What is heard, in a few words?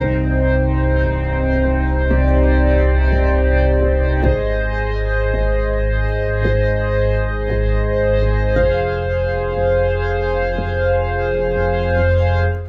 synth stereo; tape it